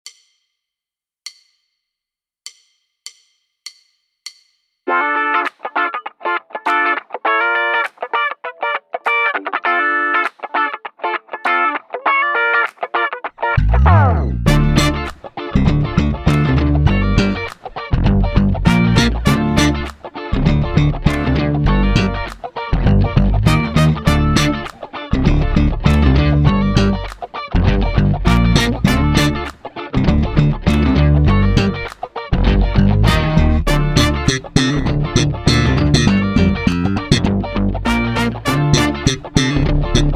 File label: drumless